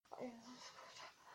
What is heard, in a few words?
click